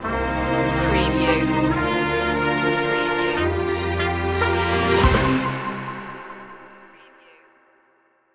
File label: newsbreak stinger